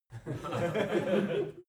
laugh short

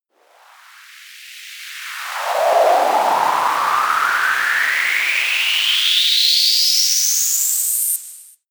cold wind

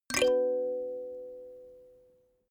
notification kalimba slide minor

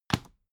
dvd case put down soft

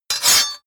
sword cut hard scrape short

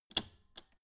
old button hard press

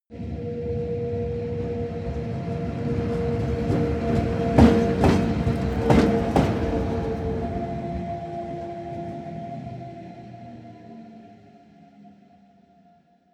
train locomotive drive by bump close

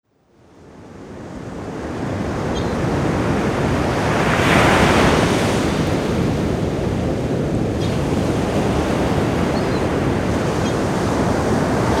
ocean beach with seagulls

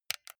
console controller xbox rb creaky press

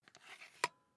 air pump small in

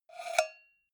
cowbell scratch hit muted

